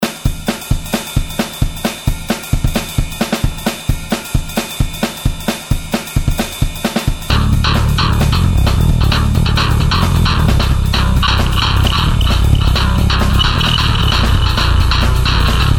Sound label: so shallow (instrumental)